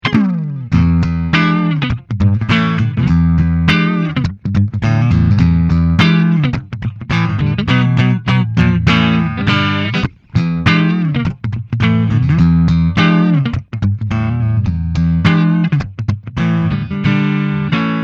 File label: Neck Humbucker Clean